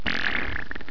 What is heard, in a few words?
player duct